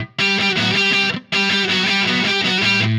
Happy Pub Guitar

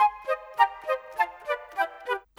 Flute; Sonic Foundry (Sony Creative Software); Orchestral VOL-2; Modern 18

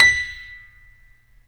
StereoGrand, Keyboards

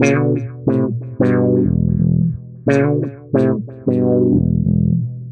Filtered Funky Bass, Electric Bass

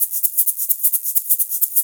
drum 130 hihat, Techno Trance, Drumloops

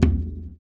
surdo; ILIO; Ethno Techno

